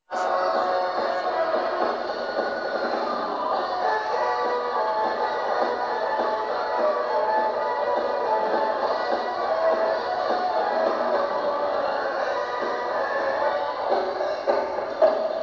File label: high notes